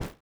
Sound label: step grass